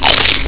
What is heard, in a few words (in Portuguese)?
mordida, sons humanos